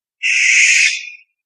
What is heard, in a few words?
barn, owl